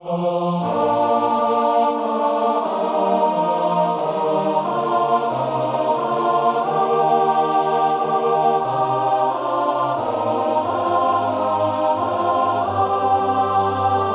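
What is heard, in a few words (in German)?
Männerchor dreistimmig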